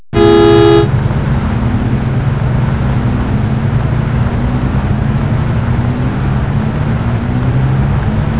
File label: STREET